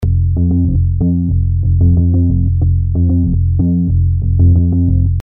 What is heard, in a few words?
A jam room bass loop 3 A